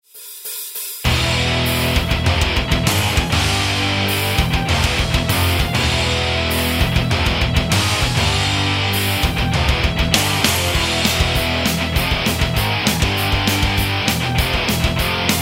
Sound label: metal